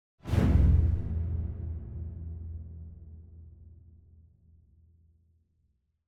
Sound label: Symphonic Hit Bass Strings Hit; Hollywood Edge; sound designer's toolkit v2